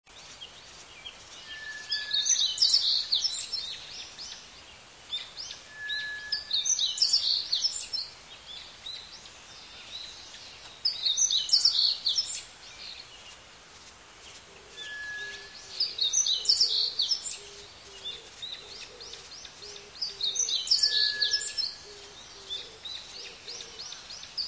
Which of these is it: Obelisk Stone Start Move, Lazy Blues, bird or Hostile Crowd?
bird